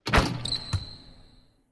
灌篮; 战中; nba

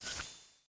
卡牌互换; nba; 战中

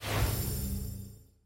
卡牌出现; nba; 球探系统

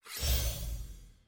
战术 升级; nba

